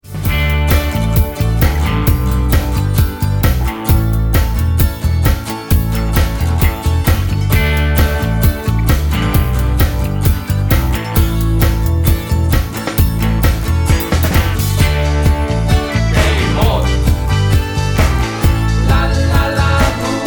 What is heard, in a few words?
karaoke